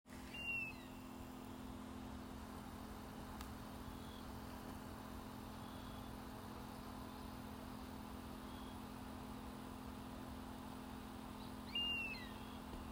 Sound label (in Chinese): 東方蜂鷹